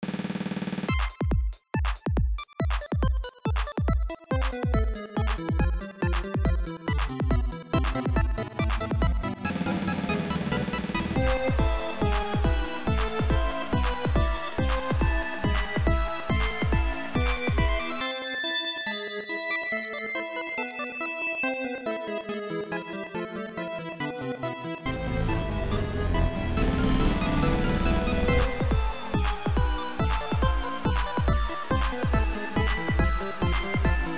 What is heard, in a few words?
fly beat